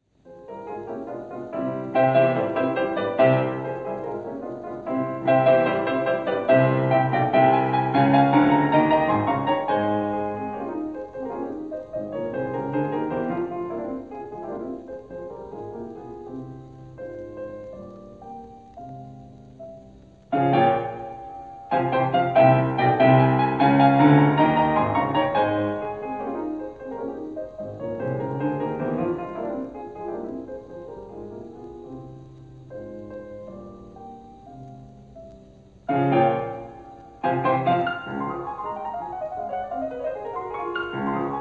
Sonata for Piano No. 31 in A-flat major, Allegro; Ludwig van Beethoven; Op. 110; II. Allegro molto

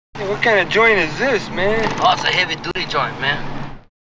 FX and Scratches

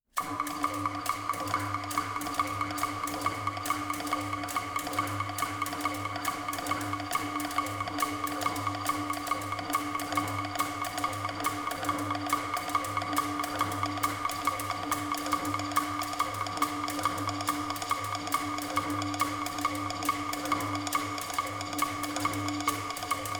Cannibal Party Drums; Return to the Blue Lagoon

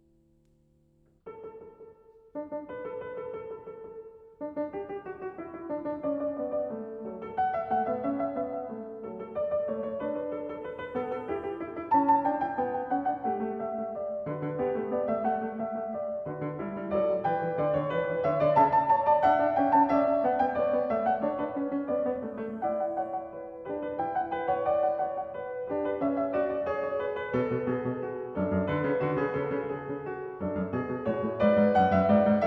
Fugue in D major, Piano

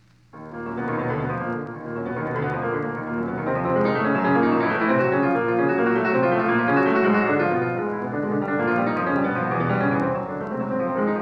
Prelude in E-flat minor; Frédéric Chopin

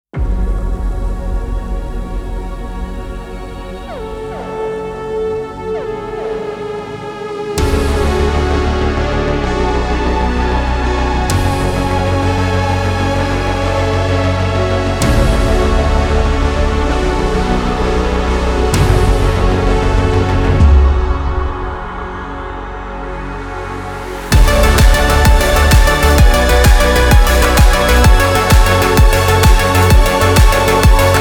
(instrumental)

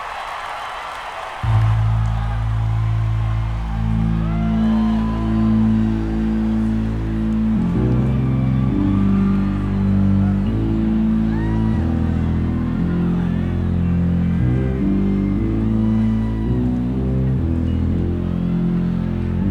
Bass Solo

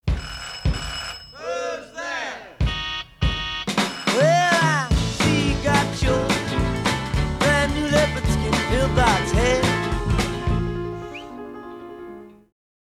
false start

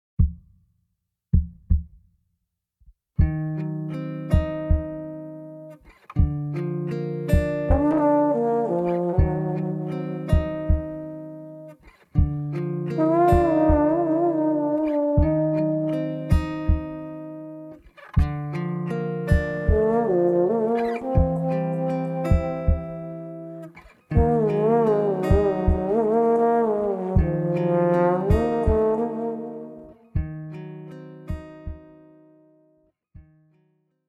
Interlude Trombone

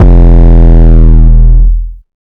BREAKS AND 808S

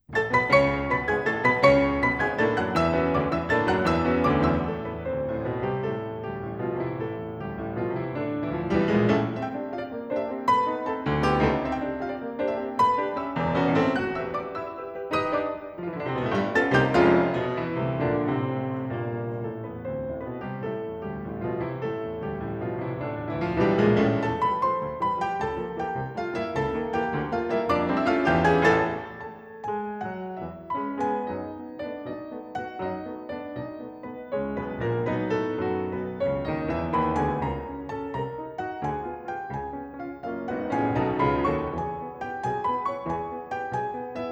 for piano 4 hands; Erik Satie; La Belle Excentrique (Fantaisie Serieuse)

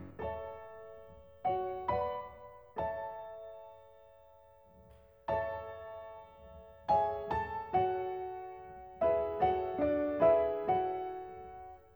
for piano 4 hands; Erik Satie; Cinema; La Poursuite

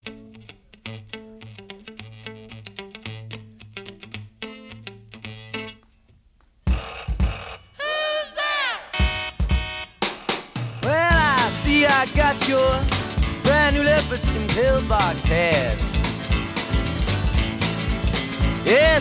breakdown

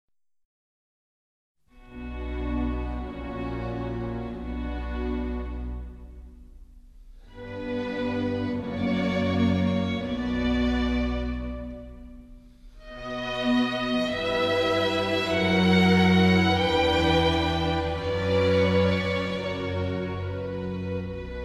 Sonata For Trumpet & Strings In D Major - Adagio

